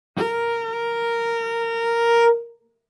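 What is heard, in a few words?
Cello arco fortissimo sul D Bb4; MIS Pitches - 2014; Strings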